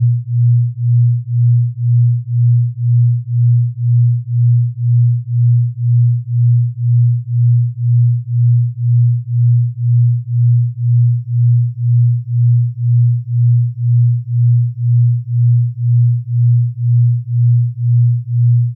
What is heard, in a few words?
delta soothe 2hz binaural; meditations